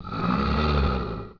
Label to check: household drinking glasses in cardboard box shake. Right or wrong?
Wrong